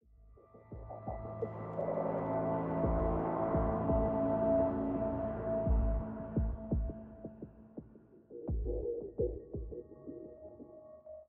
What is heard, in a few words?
Military High 85bpm; Legends of Pegasus; windows gamerip 2012